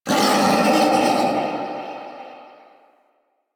Feral Vocal Call; Enemies; Kubrow; Warframe

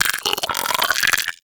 Arachnoid Vocal Grapple; Enemies; Vocals; Warframe; Corpus; Arachnoid Hunger

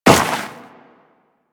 Walk Snow, Footsteps; DE; Warframe; Tenno

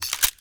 Refill Clip; Warframe; Duviri; Boon Powers

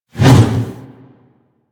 Mace Whoosh Slow; Warframe

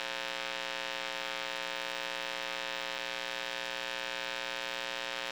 Electrical Box Hum Loop; Ambience; Props; Warframe; NineteenNinetyNine

